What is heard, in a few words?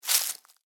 Footstep: Individual Footstep On Dry Leaves; Human; Footsteps; Stuart Duffield